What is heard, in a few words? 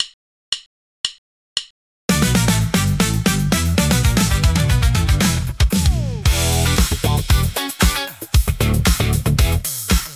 vibe full tempo count in